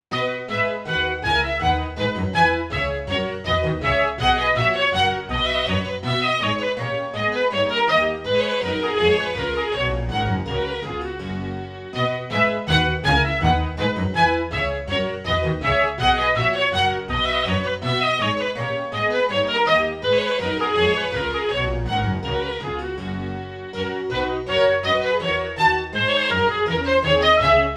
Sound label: Gavotte, harp quartet; Bach